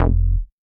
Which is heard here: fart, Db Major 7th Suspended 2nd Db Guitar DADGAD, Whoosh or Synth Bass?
Synth Bass